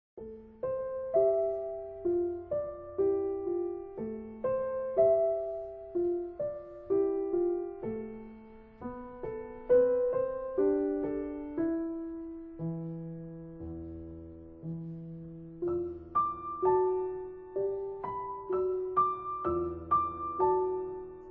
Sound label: Pavane; Ravel; Belle